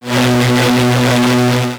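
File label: Laser Fire Interior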